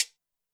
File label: shaker, Zoom MRT-3B